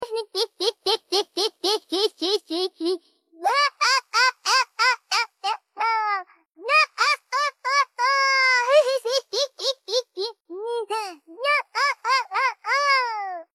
hehehehehehehehehehehehehehe

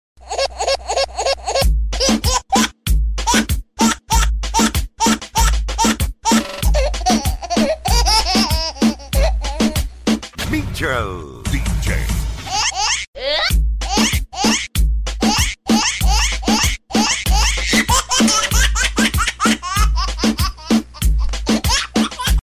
baby laughing remix

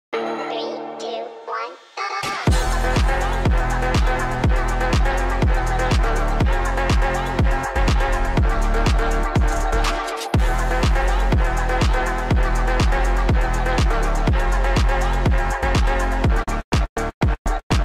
phonk walk

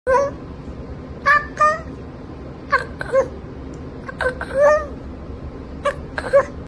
Cuckoo Сat

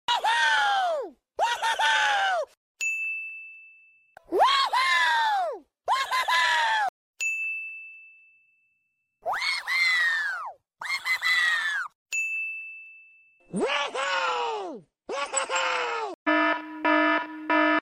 Woo hooo whoo ho hoooo

